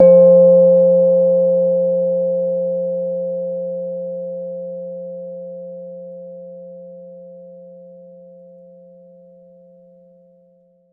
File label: Ground Plate F3; Multisamples; Sound Objects; Ableton Live 12 Lite; Core Library